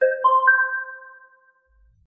Game Start

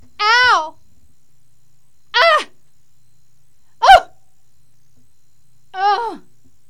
screams

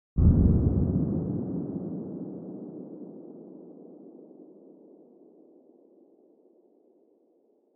Distant Shot

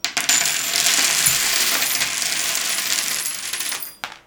Rusty Nails Rolling From Wooden Plank

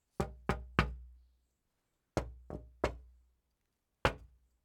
Window Knocking

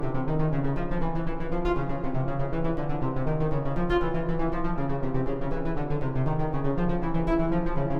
Cello Loop

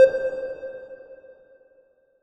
reverb triangle

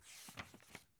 Book

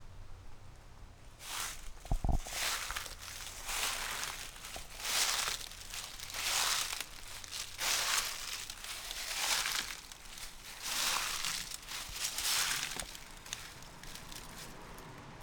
Crunching Leaves

